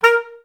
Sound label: BCS STAC A#3; Best Service; Peter Siedlaczek's Advanced Orchestra